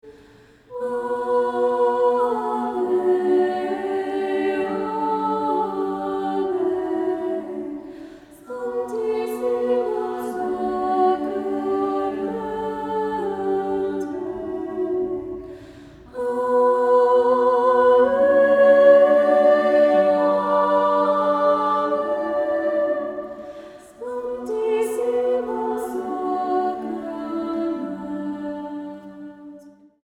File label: Adoramus te, panem coelitum, EUCHARISTIC HYMNS; Carmelite Sisters; Adoration from Carmel